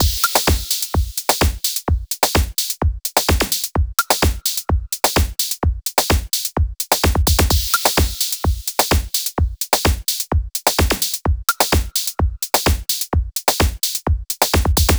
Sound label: Drum Patches; Primer